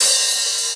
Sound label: OP Hihat; Open Hihats